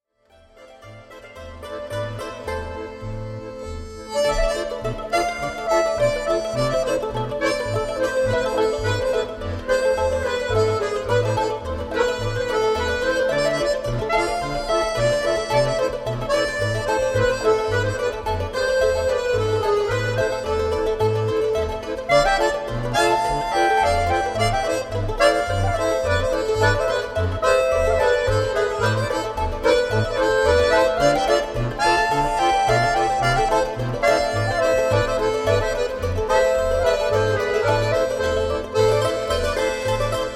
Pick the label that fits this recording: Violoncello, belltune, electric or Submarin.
belltune